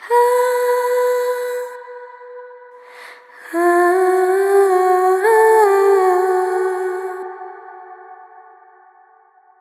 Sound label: Vocal Swell